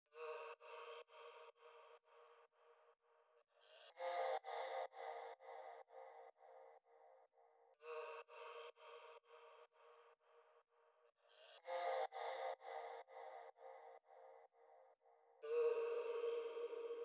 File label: VOX 125 D m